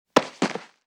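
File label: body falls onto concrete; Parkour; Butterfinger Video Sound Effects; Additional Parkour Sounds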